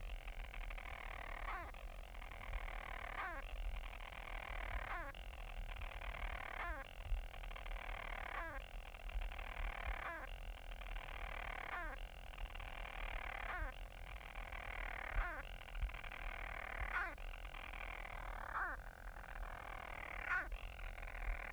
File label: cell c birdsound